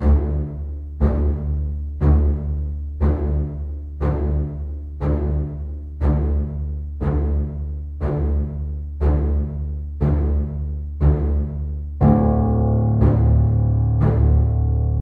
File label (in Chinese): 追逐; bgm; 猫鼠游戏